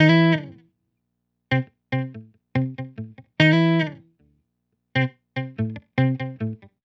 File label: HipHop Guitar Dmaj; Digidesign; Pro Tools; Pro Tools Essential Loops